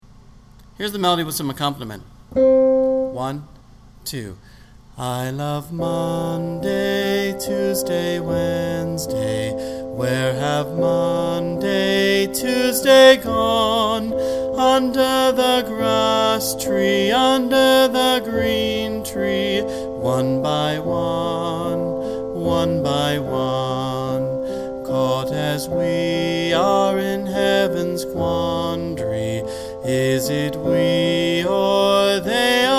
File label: melody acc